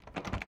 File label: Door Handle; Game rip; MiSide